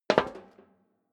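step dress boots metal rattle single stair down, footsteps; schritte; menschen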